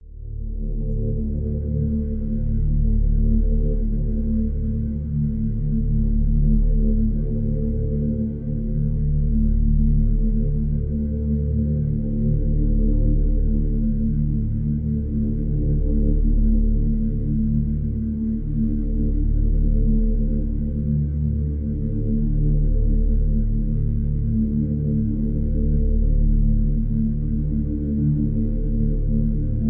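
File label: Drone Below the Surface (D); Brand X Music; Brand X Music Catalogue - Editor's Essentials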